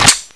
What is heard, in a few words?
m79 eject; firearms